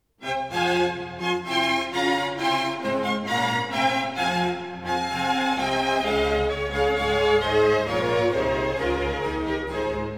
Concerto grosso in F minor, Allemanda. Allegro; Pergolese

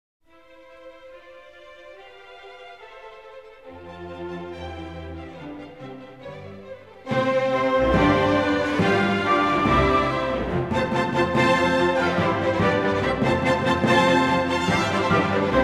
Symphony in C major, Molto Allegro; Mozart; Symphony No. 41; "Jupiter"; K. 551

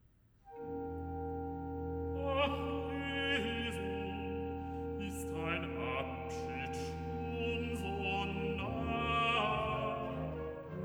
Recit (B); Bach; Cantatas; BWV 11; Ach Jesu, ist dein Abschied schon so nah？

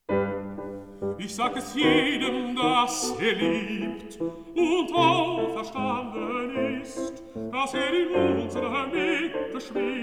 song for voice & piano

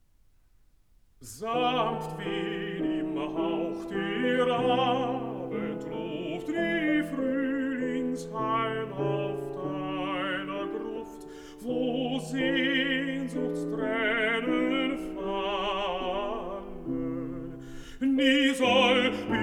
song for voice & piano

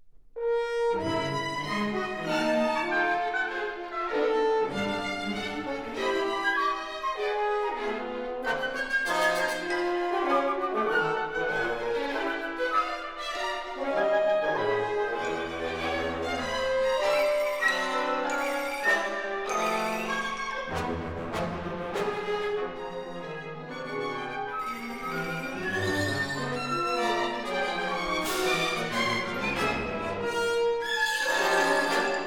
Variations for Orchestra, Variation; Schoenberg

